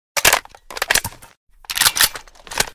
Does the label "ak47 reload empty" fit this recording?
Yes